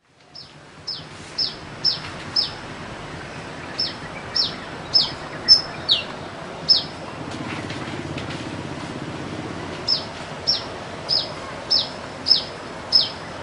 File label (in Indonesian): suara burungmadu dialam